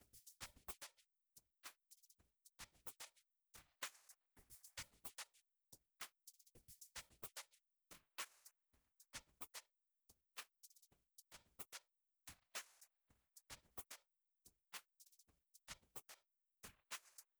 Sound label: Drums Noise; Cymatics